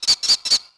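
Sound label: ambience bird short; Tony Hawk's Project 8; XBOX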